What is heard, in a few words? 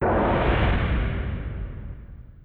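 Collision Exterior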